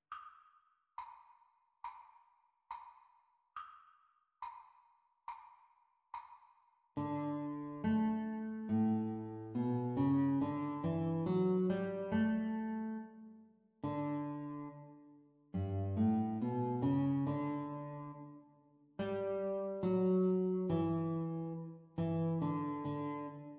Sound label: Guitar Duet Playalong Practice Speed; The Teacher part